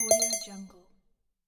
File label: 8bit point